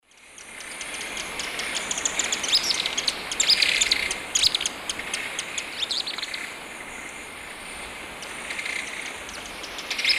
Chaetura brachyura, flock calls and song; S. America; Eastern Lowlands

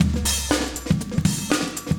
chique 120, loops, 120bpm; Sound Effect Library

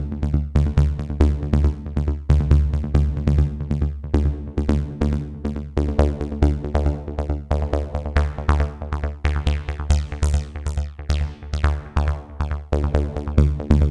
Synth, Breakbeat

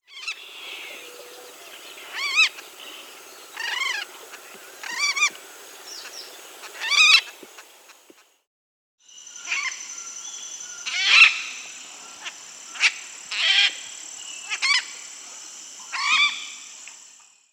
Red-bellied Macaw, Ara manilata, S. America

